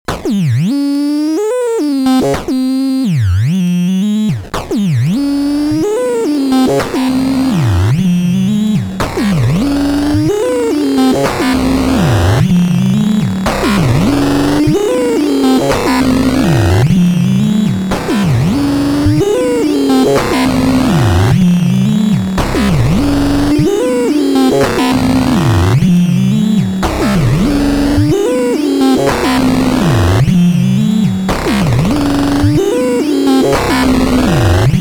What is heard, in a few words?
HSS2020 thru HSS2020